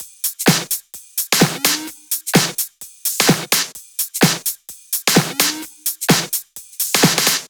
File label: 128BPM Kit; Praetor Kit